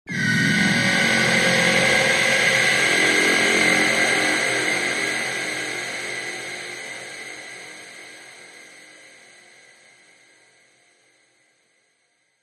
horror swell evil sinister strings ghostly haunting; zapsplat